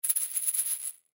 Egg Shaker Shake, Egg Shaker Shake Fast; Musical; Blastwave; Sound Effects:Musical

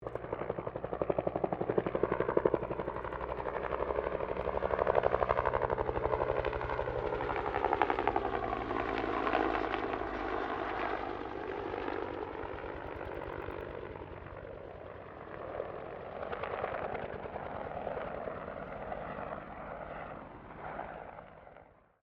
HELICOPTER 1967 BELL UH1 IROQUIOS PASS DISTANT; RAN UH-1 Iroguios; Helicopters (Sound Effects:Vehicles)

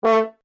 HORN A4 FF STACCATO, French Horn in F; Alexander French Horn in F; Horns (Sound Effects:Musical)

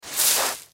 Dirt Sand Scrape Hand Move; Debris; Foley

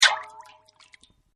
Waterdrop; Water Sounds; Sound Effects:Nature

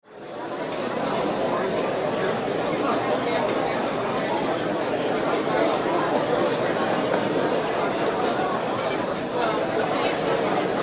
Ambience Restaurant Diner Busy Interior; Restaurants; SFX Bible

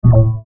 Mouseover Tonal Soft; Multimedia; Blastwave

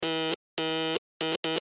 Morse Code Z Letter; Blastwave

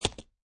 Poker Chip Dropping On Playing Cards; Poker, gambling. Poker chip dropping on playing cards.

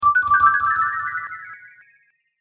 Magical Cartoon Spell Potion; Magic Sound Effects

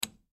Switch Off Device Light Wall; Switches; Objects; Blastwave; Foley

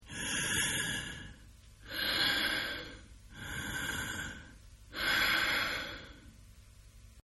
Breathe Medium Male; Breathing; Human; Partners In Rhyme

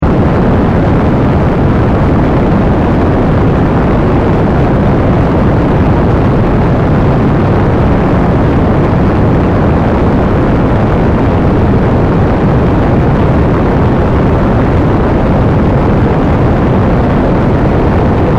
Rocket, Booster, Loop, Flame; 5.1; Sound Effects:Science Fiction; BlastWave

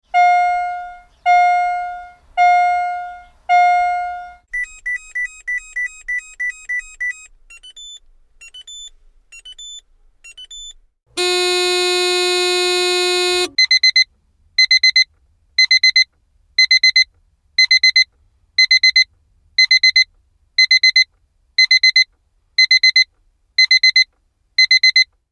Alarms 5 Types, small electronic alarms; Electronics

